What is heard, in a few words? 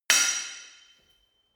sword hit sword single